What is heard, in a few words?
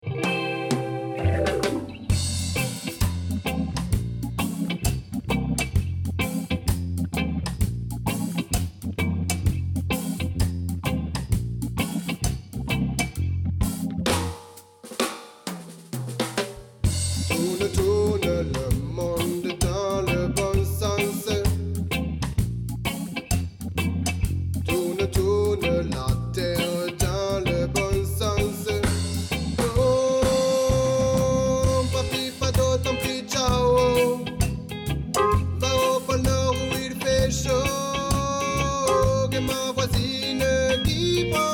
Reggae